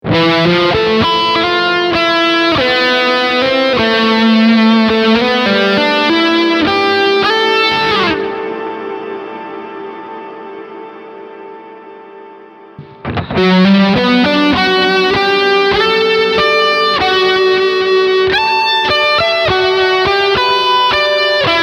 Room Long

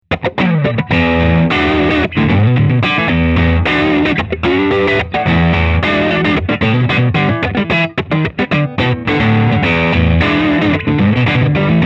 SE Electronics VR2 Crunch Guitar

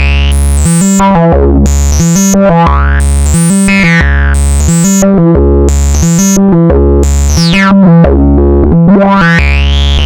Liquid Foam Loop; HerbsAndStones

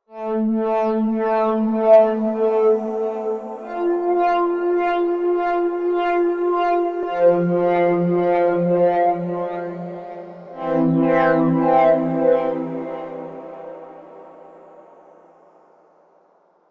Tube; Sinevibes

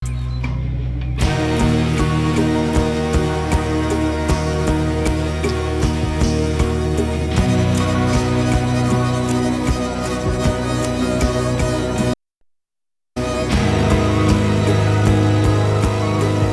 retro electronica

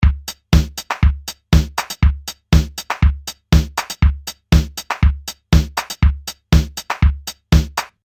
Casio RZ1 Loop

